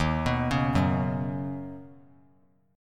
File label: Eb Minor 7th Sharp 5th Eb; arpeggiated